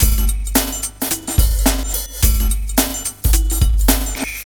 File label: RAP TRAP 108